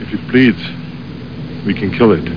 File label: bleed; os2